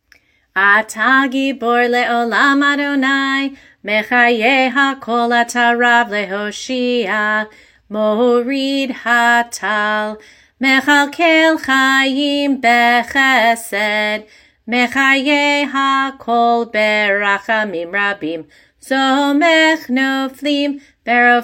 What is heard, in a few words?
Gevurot Summer chanted; bnai mitzvah; barbat mitzvah